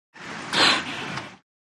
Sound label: Dolphin Blowhole